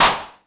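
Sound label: 909 clap; PERC TR; Wizoo - Platinum 24 Electronic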